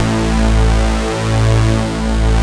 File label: SYN Poly Synnnn -L; Best Service Gigapack 1